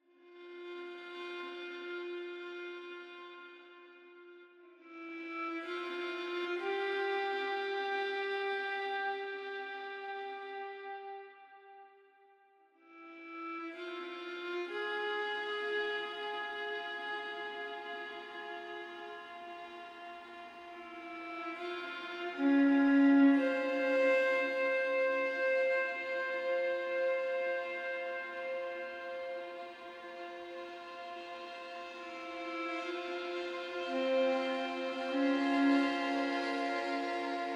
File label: Intro Music; bramble the mountain king; ps4 ps5 switch windows xbox one xbox series xs; Tuva